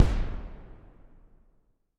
bam; tv god corvus; gbox tv boss

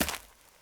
step; demon dogday

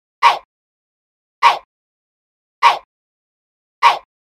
panic; sprunki v1.1; sprunkiwhite

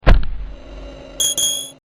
land; Garry's Mod; vinagre npc pack 1

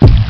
heart; the doors 2 creatures; JJK

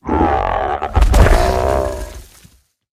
death, procoptodon; zoochosis pack

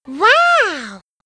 hunter pounce miss; huggy wuggy snpc; elmo

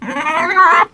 pain; The Monster Plane; kolmods preatt

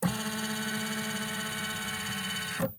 scanner; grabpack upgraded; pp3

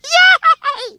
tackled; catnap n grabpack; elmo

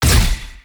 melee impacts; sprunki mr fun comp pack

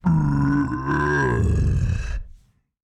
ogre death; The Monster Plane; kolmods death

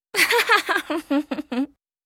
Accept

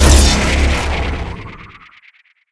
item quad take; corrupted coin

